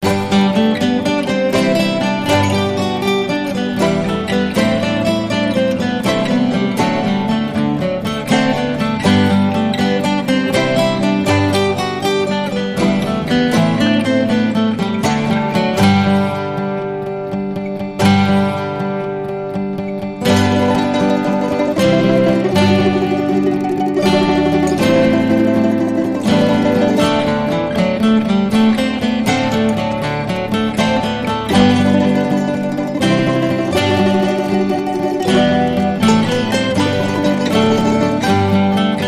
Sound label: Jesus Joy of Mans Desiring without Jingle Bells